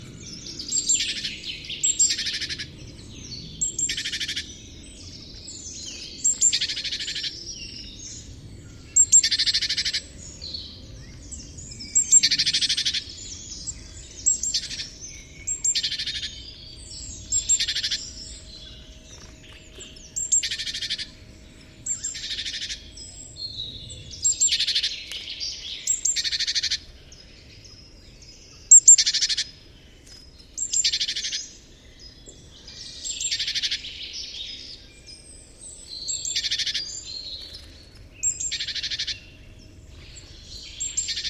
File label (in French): mesange charbonniere; chant